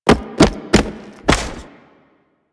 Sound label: Vent Finisher Jump Climb; Warframe; Venus; Orb Heist